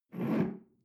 espresso grab tablecloth slide

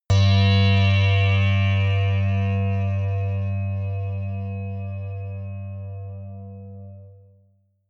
synth saw hit high

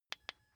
car key button click signal quick

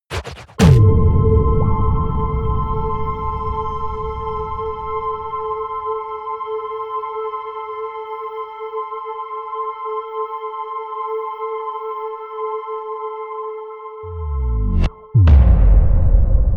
promo pad

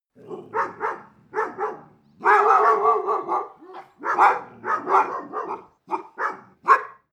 dogs neighborhood bark; natur-tiere; hunde

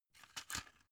drug blister pull out; berufe; arzt

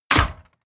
mechanical switch metal small push; industrie-wirtschaft; mechanik

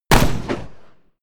revolver close shot; schusswaffen

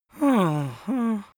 strongly disappointed boy; comedy-cartoon; soundpool

